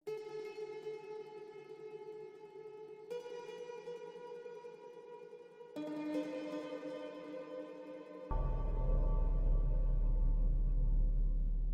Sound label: Clock Tower Slides; Silent Hill Downpour